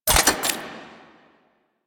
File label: Shotgun Mech; Weapons; DE; Warframe; Tenno; Pod Shotgun